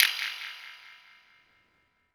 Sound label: lock radar end